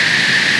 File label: Gas